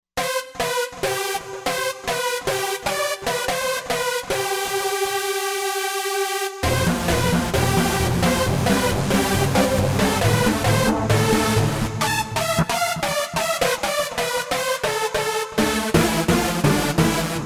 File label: Killerbeez, Roland Fantom XR